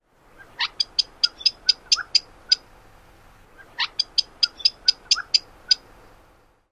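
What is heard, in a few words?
spik notes repeated twice, Gambel's Quail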